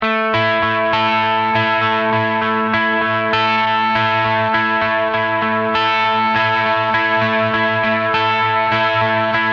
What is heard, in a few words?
guitar